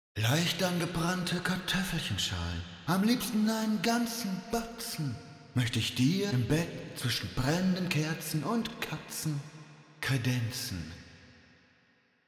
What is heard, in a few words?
Eventide SP2016 Room Vintage; OpaJott